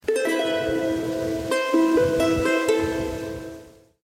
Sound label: jingle; red dead